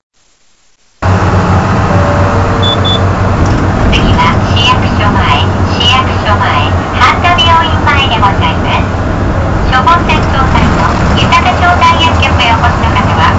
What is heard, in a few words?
bus tape orgel; aichi; chitabus